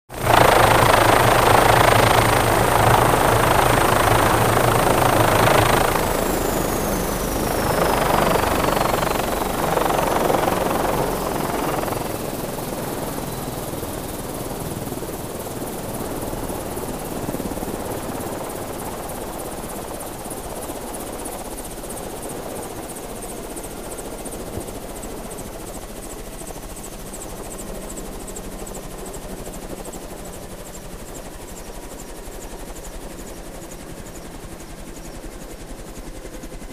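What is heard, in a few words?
helicopter lands and shuts down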